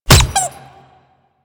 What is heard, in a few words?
Punch Impact; Royal Jester; Attack